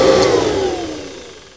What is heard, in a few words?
Vacuum Off; 1997 MMX LAN PACK (win 95); Army Men